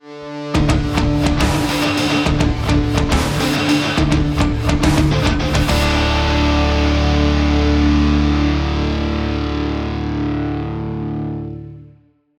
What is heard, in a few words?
Mission Failure Cue; Warframe; Cues